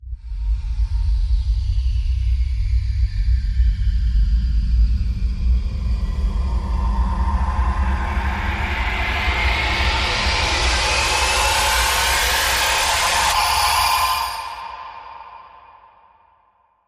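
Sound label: creature; Risen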